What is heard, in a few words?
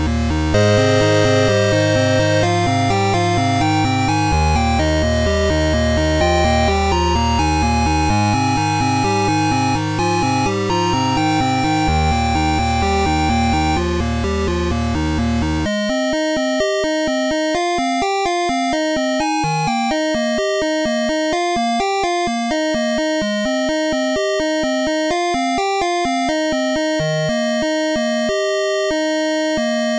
8-bit